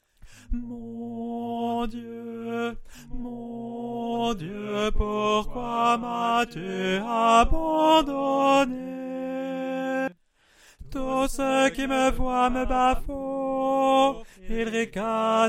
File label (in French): ensemble T; Tenor